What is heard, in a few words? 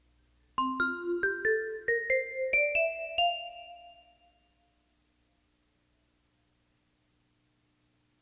melody recognition